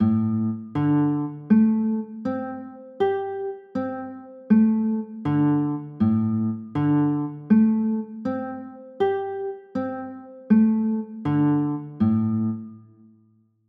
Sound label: d 7sus4, chords